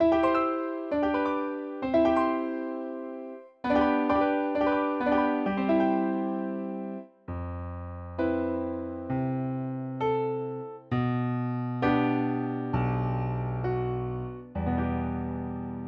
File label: 4 piano